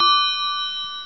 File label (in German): 1 Glasen